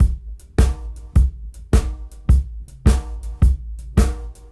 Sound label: Shuffle 105 BPM; AMG - Keith LeBlanc Phat Butt Jams